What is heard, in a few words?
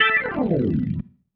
Slide, Organ, Loops